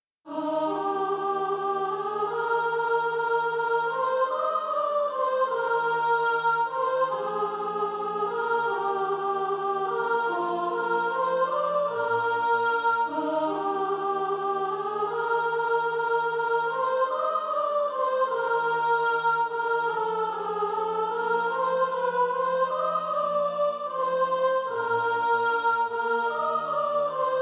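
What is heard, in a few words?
soprano, Practice Music; SATB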